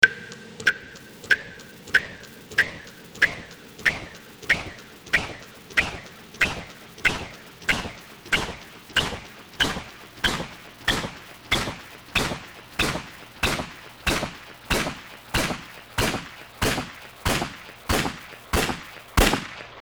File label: drip gun